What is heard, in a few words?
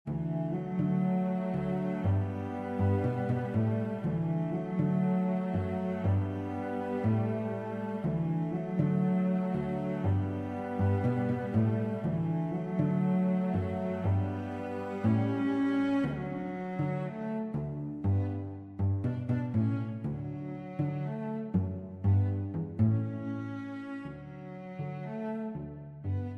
Pizzicello; Besetzung: Cello-Trio; Schwierigkeitsgrad: 2 - Einfach; Tonart: D-Dur